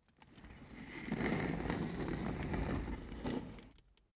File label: office chair trundle slow long